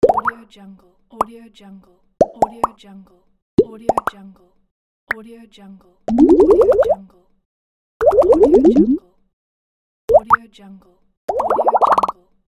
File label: bubbles pops